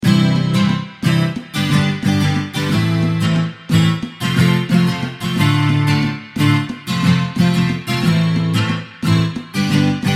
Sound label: country like strumming pattern, electric guitar